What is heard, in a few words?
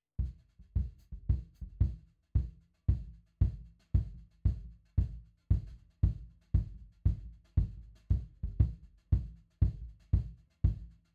Kick IN